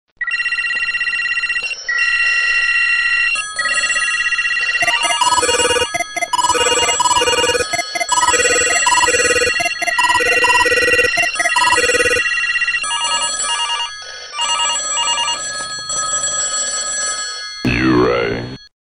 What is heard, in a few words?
Very Annoying Ringer